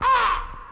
silly elec fx; UNUSUAL KITS and FX; Silly Electro FX Kit

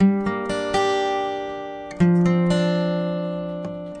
simple acoustic guitar; GUITAR LOOPS

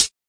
hat; Hats

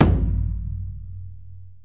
big taiko, Japanese Drums; ETHNIC and WORLD PERCUSSION

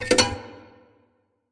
nylon scratchy hit; Weird and Interesting Hits

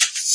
Processed Ethn Perc, Doru Malaia Percussion

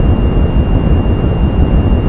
flight; tacscan

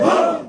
"woah", voice

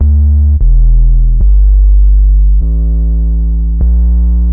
meandering supersynth bass; MELODIC SAMPLES

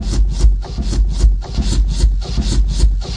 151 sawing wood groove; 141 to 160 bpm

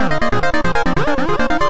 140 bright white acid line fx; SYNTH AND ELECTRONIC LOOPS

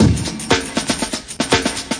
120 bpm, drum loops and breaks; suckit

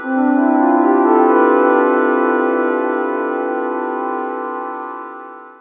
synth climbing stairs pad; Pads

